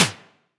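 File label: Snare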